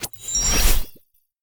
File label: match confirm; # + Sweet Rain v1.2 『Cylentic』 + #